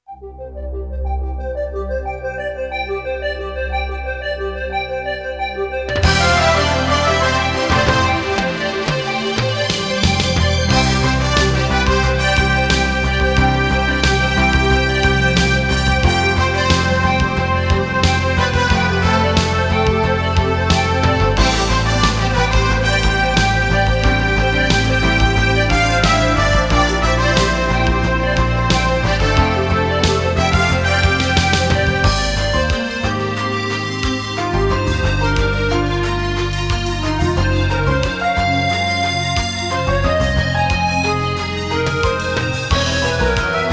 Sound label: Synthesizer Version; Super Famicom Magazine Vol.11